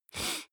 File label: nose sniff male sick; koerpergeraeusche; menschen; soundpool